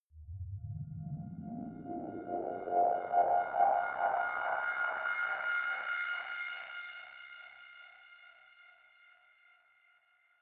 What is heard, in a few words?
static string slow; risers